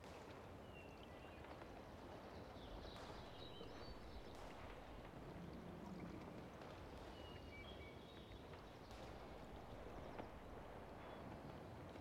ambience loop; tft

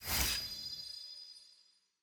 missions wipe completed

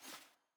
sfx ui generic section paper hover

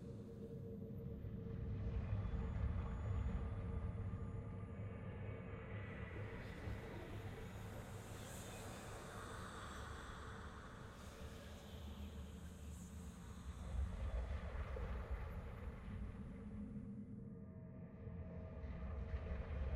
amb cave; targon

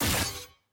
aah; gwen

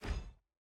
reward locked

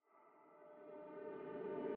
mood intro sad

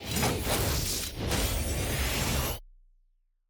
sfx loot upgrade intro

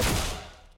skill hit; jhin

